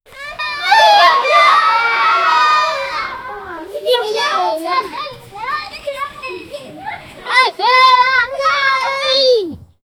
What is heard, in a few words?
cartoon kids laughing and